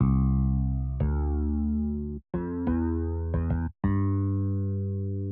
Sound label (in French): compo msm basse